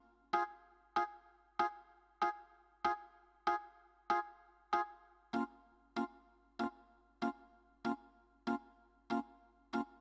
MELODICS, viral hiphop

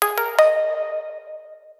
alert

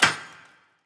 Shrapnel Hit

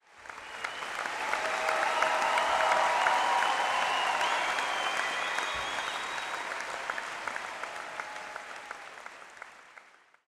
crowd Chatter arena; spellbound

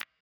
balloon auto cashout countdown

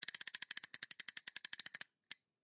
spin Slow

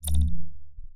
ui menu teleport hover

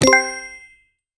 cash out

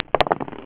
dice

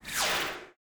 Mail Sent

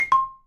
notice

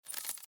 ui long

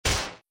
smg shot

